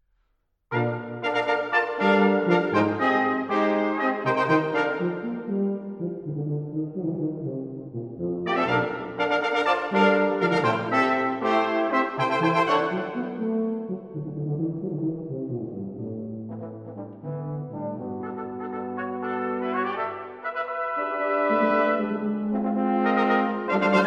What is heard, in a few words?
Fanfare, Brass; Art Collection music of Arthur Frackenp